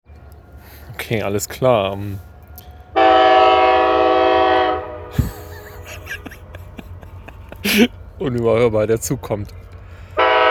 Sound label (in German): kanada trains, british columbia rocky mountains; kanada roadtrip